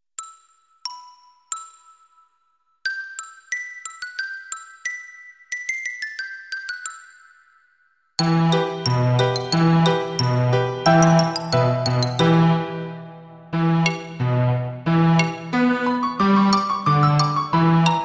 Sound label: karaoke; angry birds